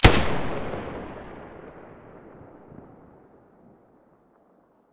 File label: muzzle galil stereo, weapons